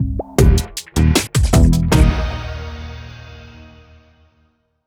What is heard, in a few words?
Opener; Report2021